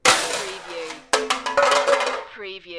Metal Crash Drop Debris Settle

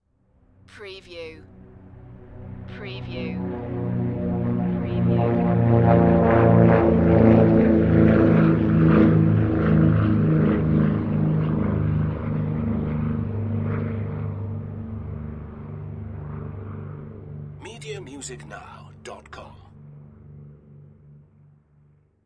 Airplane Large Prop Plane Take Off

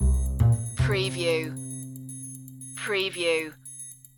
smooth approach loop

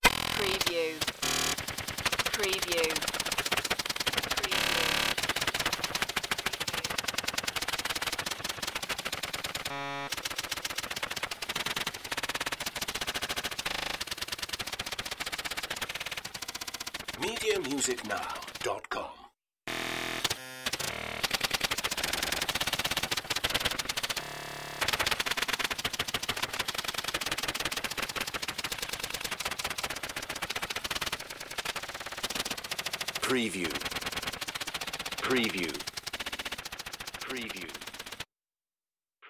machine error rattling

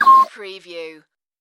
Tui Peep

